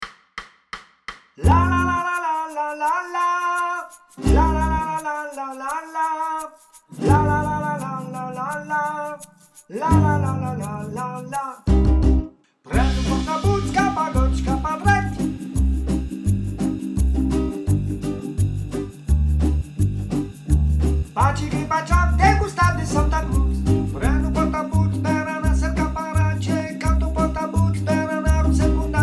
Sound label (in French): Alti, chorale